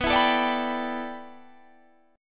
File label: A 7th Suspended 2nd Suspended 4th